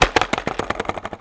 Board Bounce

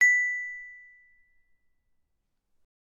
Xylophone B

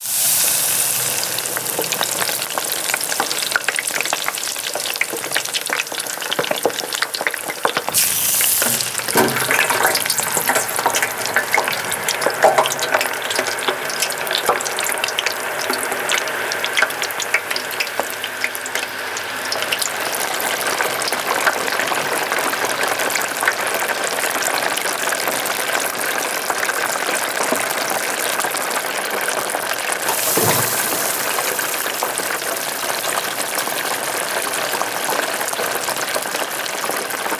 Deep Fryer Sizzle

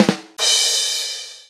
Cheesy Rimshot

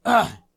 Male Hurt

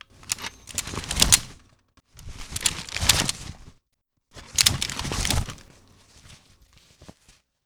A Pistol Or Revolver Being Holstered Unholstered; 3 Takes

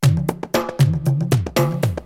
Tribal Beat

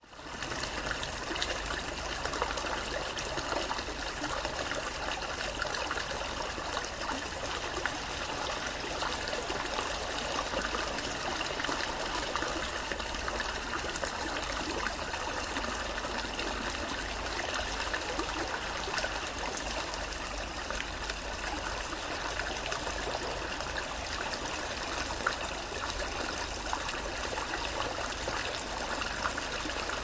Stream Water

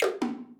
Tom tom Liquid

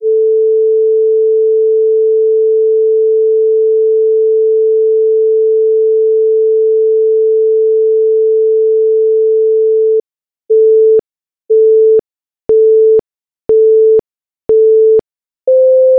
Alpine Start Beeps

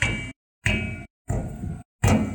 Metal Clang

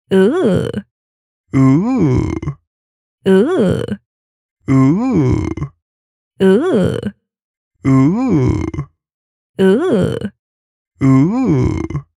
Ooh Female Various; Remix of audiorichter

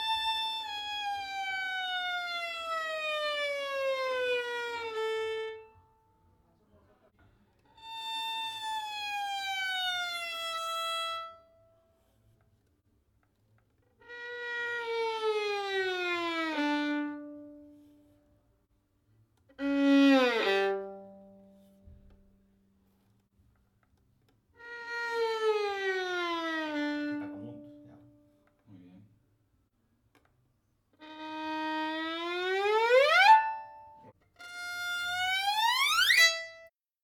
Violin Tenuto Non Vibrato Glissando

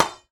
armor

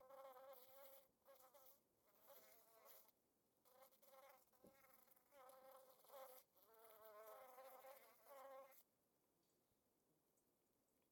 Bees

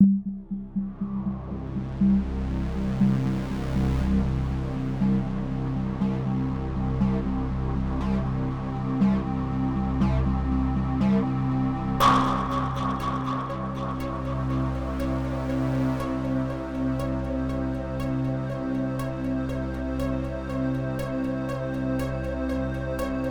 Threatening

